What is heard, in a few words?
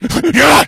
become enraged, infected; npc; alert; male